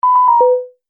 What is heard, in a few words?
Multimedia Event Notification, beep sound effect